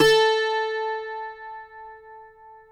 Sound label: CUATRO A 4, Guitars; Spectrasonics; Hans Zimmer Guitars VOL-2